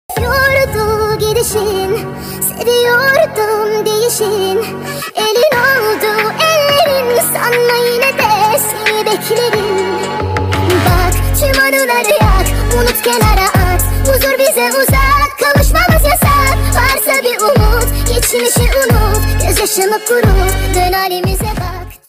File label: speed up